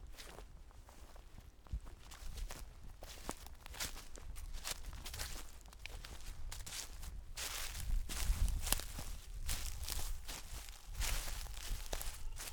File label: Footsteps On Crunchy Leaves